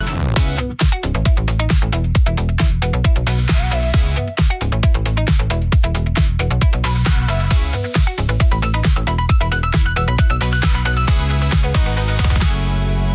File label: loop